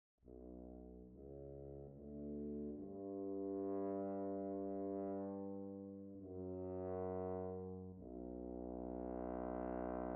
Tuba

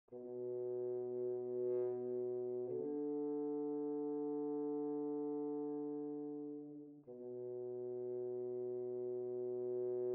Horn

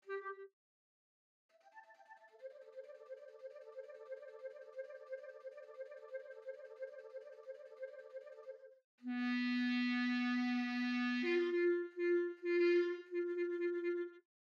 Clarinet; Holst Jupiter

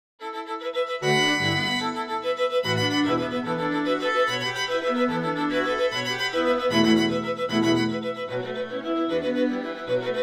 ending for collab quartet

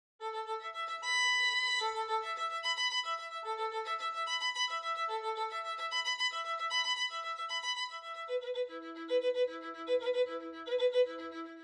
Violin; Planned ending for collab quartet

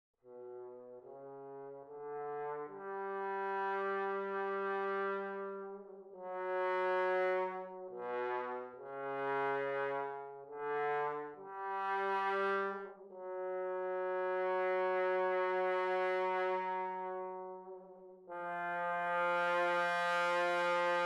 Bass Trombone

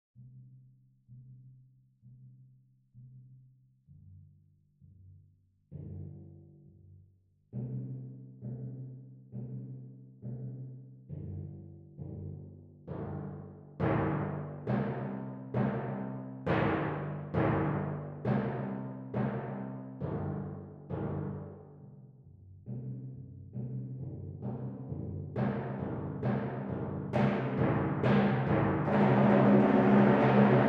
Timpani; Overture or Something

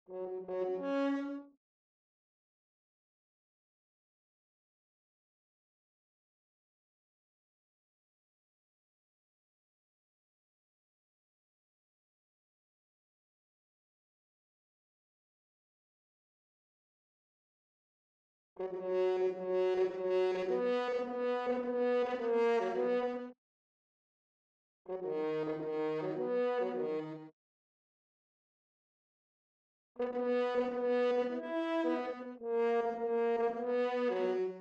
Horn

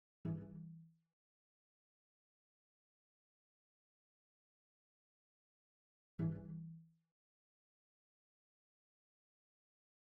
Cello; Rondo

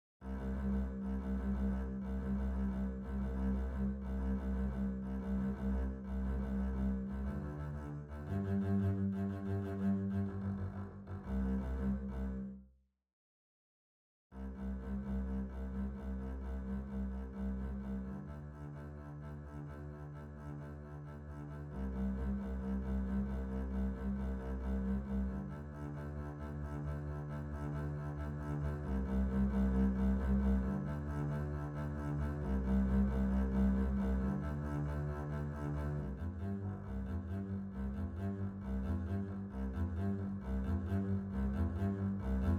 Double Bass; Overture or Something

